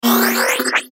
Flash Animation Multimedia; Web Interactive; Button Sound Effects; Blastwave; Technology

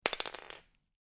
Lego Single Lego Piece Drop On Floor; Miscellaneous Toy Sound Effects

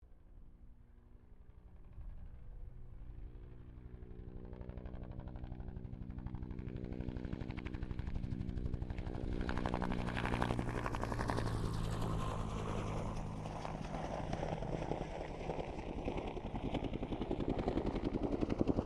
HELICOPTER BELL UH1 IROQUIOS PASS; RAN UH-1 Iroguios; Helicopters; Sound Effects:Vehicles

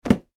household book put down; Book Being Put Down On Hard Surface; Offices; Books; McKinney Sound

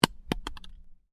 Apple lands on concrete and bounces slightly; Miscellaneous Impact; Stuart Duffield

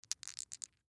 Dice In Hand Rattle Light; Dice Movement; Casinos; SoundEffects-Blastwave; Sports

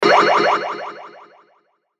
Hit Sweeper Delay; Hit Sweeper Delay Ascend; Sweepers; Blastwave

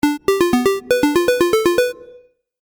Game App Melody, Video Game Sound Effects; Twistedtracks

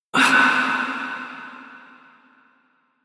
Human Fx Grunt; Grunt Sound Effects; Human Fx - Grunt 9

